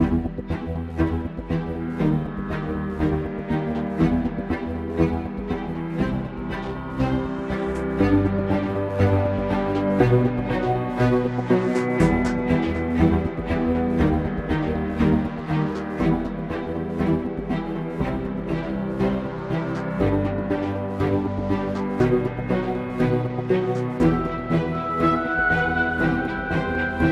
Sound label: Ambient